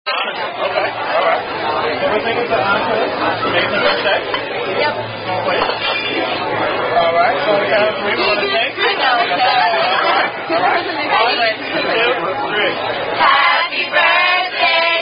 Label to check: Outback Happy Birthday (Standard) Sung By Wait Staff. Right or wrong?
Right